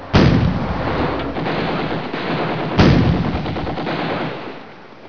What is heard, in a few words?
Artillery, guns explose